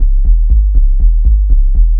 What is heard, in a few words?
Bass Line; Bass Lines; Eclectic Electronica